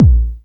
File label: Kick, G-